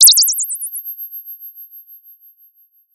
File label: Sound of a bird tweeting nicely